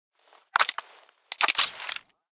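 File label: weapon pistol plasma reload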